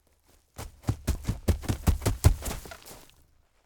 Running On Mulch

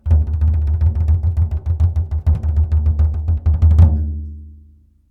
Tambourine Roll

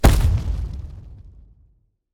Superhero Earth Impact Hit

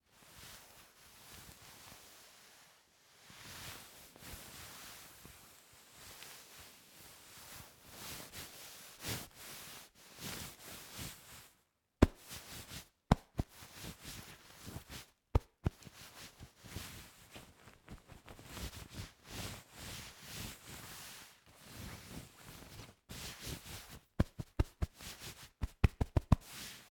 Duvet Cover

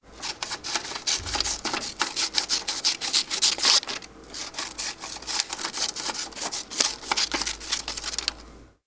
Cutting Paper

